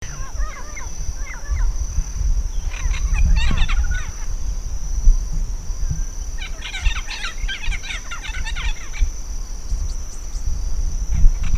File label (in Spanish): loroharinoso, Loro Harinoso, Amazona farinosa, Mealy Parrot; cantos